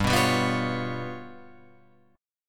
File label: G 7th Flat 5th, down, Guitar, Open F, G7b5 chord; strummed